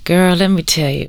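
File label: girl let me, female vocals, right; PlatinumPhattCD2; E-MU Producer Series Vol. 8 – Platinum Phatt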